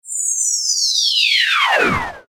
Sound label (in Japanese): 下降